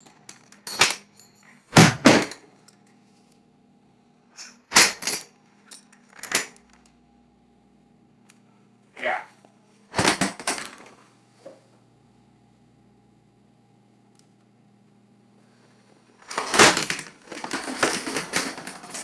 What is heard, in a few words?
laptop dropping and clattering on hard floor